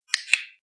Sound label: click click